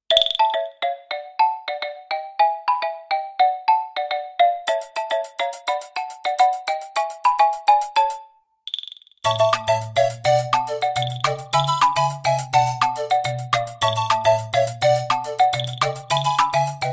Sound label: Nokia Latin Pep Ringtone